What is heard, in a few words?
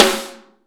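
snare ambient; Best Service; Real Mega Drums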